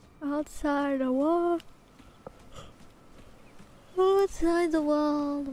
Yawn

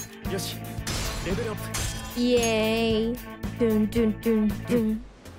Singing; P5 victory ost; IRySinging

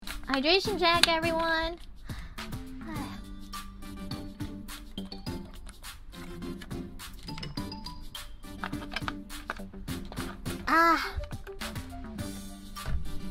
hydration check plus deep breath

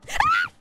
quick scream; IRyScream

